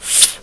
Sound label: turn; capri